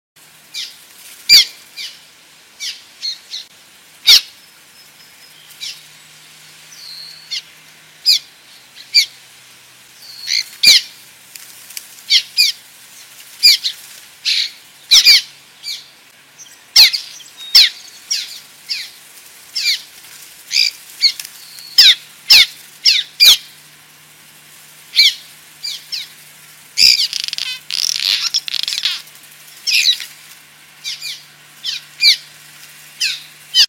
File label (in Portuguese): Canto do andorinha das arvores